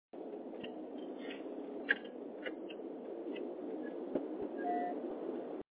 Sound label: weird intermittent noise drivers side glk250; glk-class x204